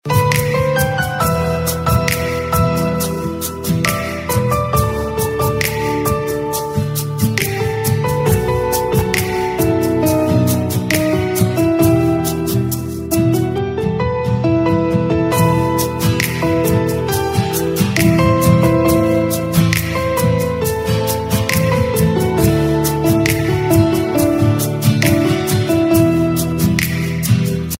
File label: Instrumental Ringtone